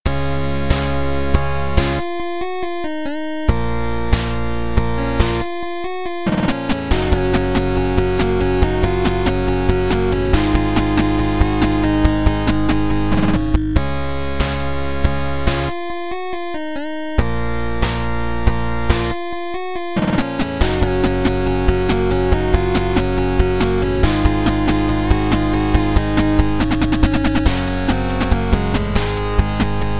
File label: 8 bit